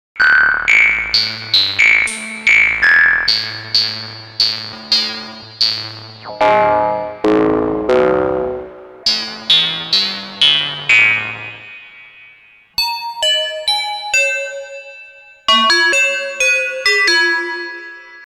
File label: Mini Korg 700S Ring Mod